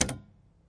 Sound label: keypress, Typewriter